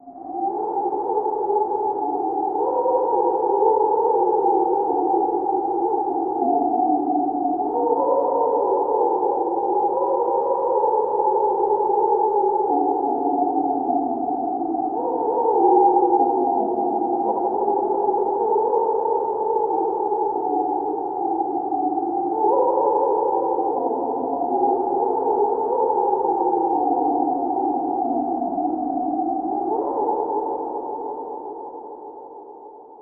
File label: Ghost Voices Halloween